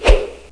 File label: Horror Maximize